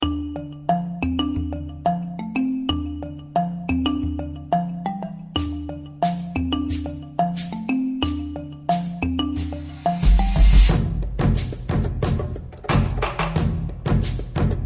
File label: African Metal